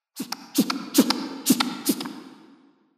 P Coquereli shee-faks, Lemur; double-unit "shee-fak" call; The Audible Phylogeny of Lemurs

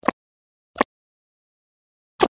Catta barks, lemur; "plosive barks"; The Audible Phylogeny of Lemurs

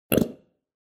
E rubriventer snort, Lemur; snorting and sneezing sounds; Eulemur; The Audible Phylogeny of Lemurs; high-arousal barks and alerts

